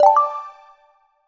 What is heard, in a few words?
notif